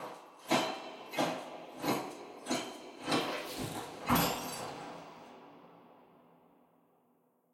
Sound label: sleep enter phase